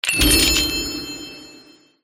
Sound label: clear tile